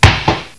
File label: Bouncing